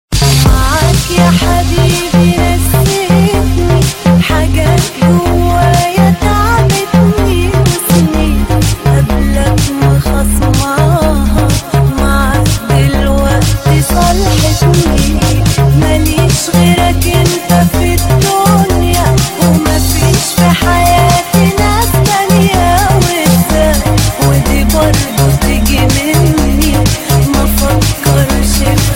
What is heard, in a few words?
arabic ringtone